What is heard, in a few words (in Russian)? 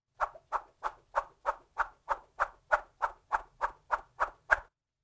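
звук рассекания воздуха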